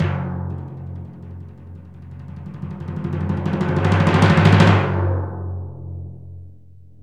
PRC TIMP RO, Timp Cresc; Roland LCDP03

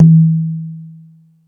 Jungel Percussion, E-MU Pro-Cussion; drum machine

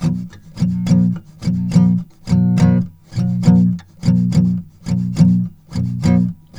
C 3 L, Guitars, SLOW SHUF; Spectrasonics; Hans Zimmer Guitars VOL-1

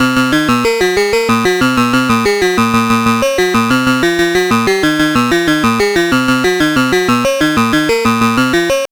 ARP2600; AMG - Vince Clarke Lucky Bastard

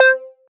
Dropbell; Zero-G; Soundclash; Acidized Wavs

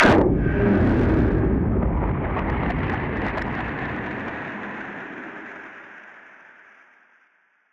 Noise Bomb; musicradar; bomb factory

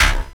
snare+hat; DRUMS

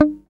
GUI MUTE, E-GUI MUTED

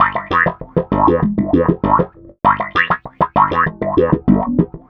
Processed Slap Bass, Electric Bass

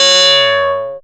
fx C#, Analogue FX, Dance Synths

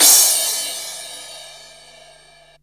CYMBALS, Disco House; AKAI; USB Soundscan vol.20 - Fresh Disco House I

